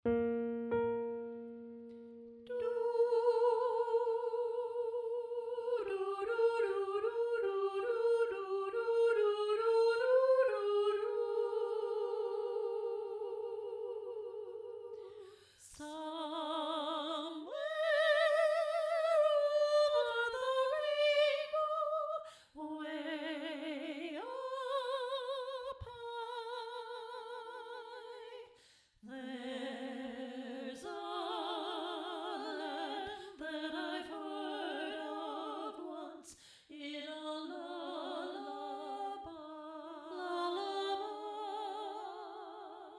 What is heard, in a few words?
Rainbow SOP